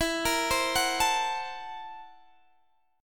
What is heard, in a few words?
Gb 7th Sharp 9th Flat 5th E, guitar